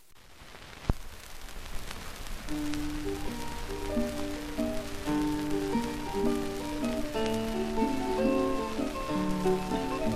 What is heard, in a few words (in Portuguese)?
variações em sol menor